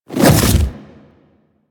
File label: Melee Swipe; Warframe; Infested; Grey Strain; Splitter